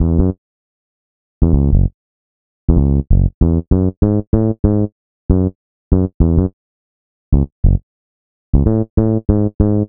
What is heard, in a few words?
E-Bass; Cinematic Funk; Sonic Foundry (Sony Creative Software); Cinematic Funk Urban Tone Poems